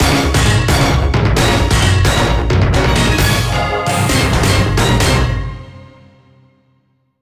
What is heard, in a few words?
jingle team draw; Tank! Tank! Tank!; Wii U gamerip 2012